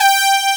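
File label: brass G3, SYNTHESIZER analog brass; AKAI S6000 CD-ROM - Volume 1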